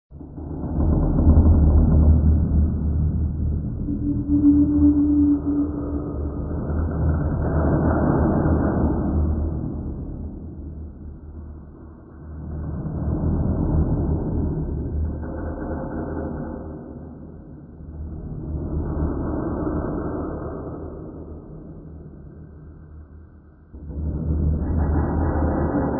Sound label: deep sounds; Halloween Witches Ghosts Goblins Bat